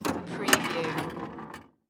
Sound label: Pinball Machine Plunger